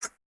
ui pickup spring; Rust

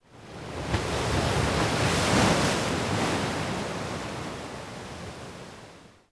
Ocean Waves; Ambient; Civ4

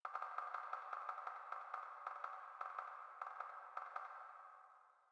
sting woodpecker; Rust

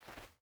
footstep burlap cloth walk; Rust

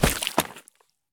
body drop puddle; Escape from Tarkov

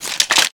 weapon; Call of Duty Modern Warfare

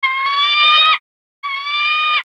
Amb Animals; Red Orchestra Ostfront 41-45

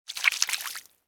ambient; World of Tanks

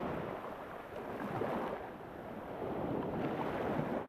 ambient port default; World Of Warships

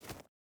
footstep burlap forest jump start; Rust

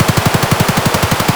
vityaz indoor distant loop; Escape from Tarkov

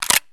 rem870 pump in; Escape from Tarkov

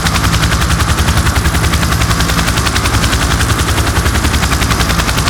blackhawk idle; veh blackhawk idle

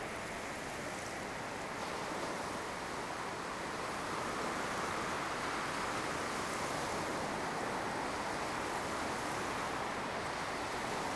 ambience beach; Rust

